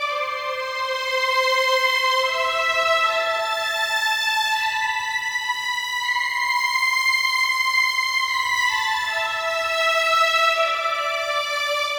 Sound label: Violins a; скрипка